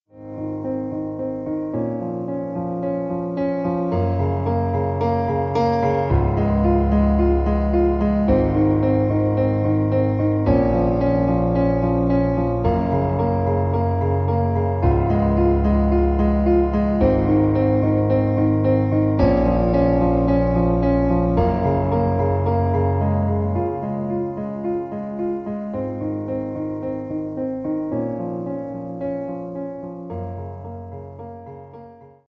piano

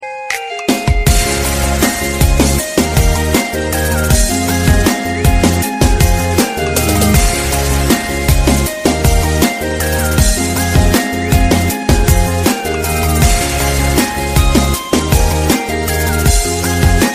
instrumental

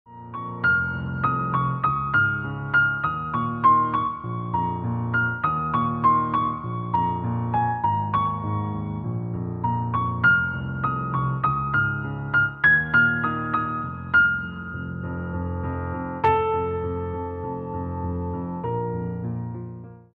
piano cover; The Witcher

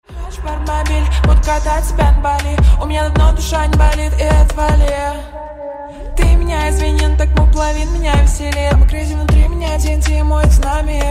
slowed reverb

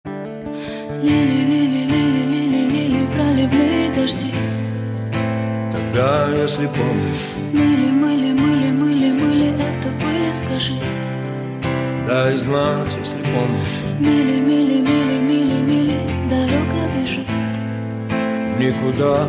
live acoustic

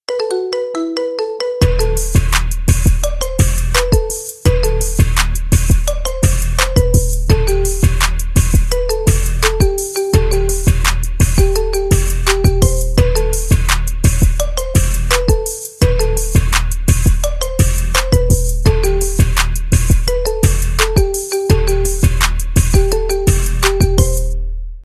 marimba remix

